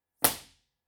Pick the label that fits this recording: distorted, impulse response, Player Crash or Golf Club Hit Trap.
impulse response